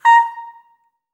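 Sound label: staccato A#5, soprano, Voices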